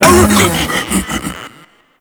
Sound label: Pain; Warframe; Proto Infested; Ape Prelate